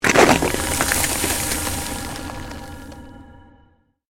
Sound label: CREATED MAGIC SPELL FREEZING TOUCH; A series of magic or arcane spell sounds designed to suit fantasy themes, Arcane,Created,Fantasy Magic,Ice Magic